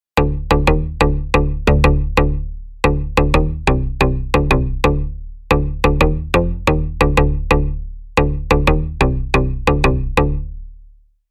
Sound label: Pluck; Routed Sound; Spire Expansion - Noble Gangster Vol.1